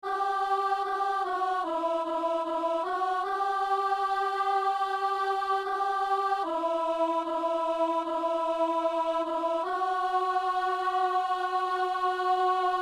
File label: alto; Saint-Denis; Par Jésus-Christ ressuscité (P.U.)